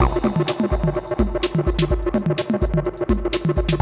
ACID JAM

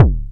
Synth Kick; Digital Sound Factory Xtreme Lead

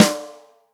rock snare chromatic, real drums; Best Service ProSamples vol.43 - Real Drum Kits

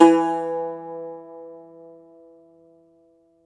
cumbus saz E, plucked strings; E-MU Producer Series Vol. 7 – Old World Instruments

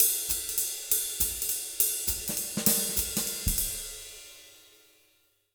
Jazz Latin Drumloops; USB Soundscan vol.08; AKAI

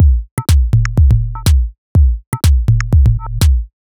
A 123bpm, House Drumloops; Zero-G House Fabrik Drumloops

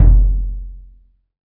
bass drum; E-MU Formula 4000 Series Vol. 5; Protozoa; Proteus2 Presets

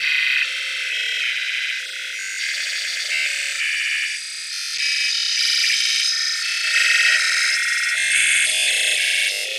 Airglow, Environments; Sonic Foundry (Sony Creative Software); Myths of Technology

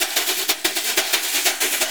Percussion Loops, Shaker & Tamb; Houseworx

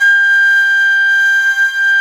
WND FLT MT, Flute & Mute

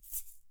EGG, Percussion; Sampleheads; NYC Percussionworks; ST PERC VOL1

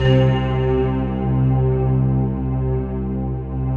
STAC, synbell

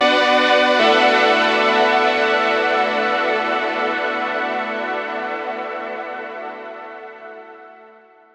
E Syn; Solar Panels; Synths; Zero-G; Future Wave

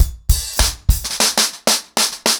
100BPM, ACOUSTIC DRUMS

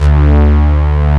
OBX string; E-MU Formula 4000 Series Vol. 3 – Analog Odyssey; VK+ Instruments

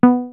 DISTORTION BASS

